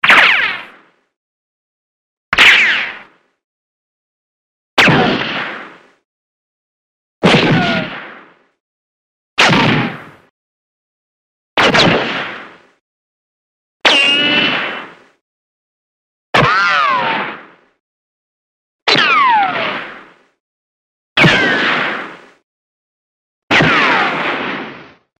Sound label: classic ricochet